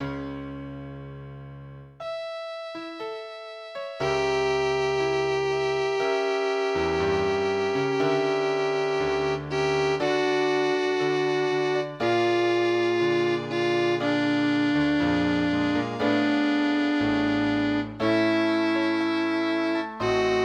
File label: Alto; Gratias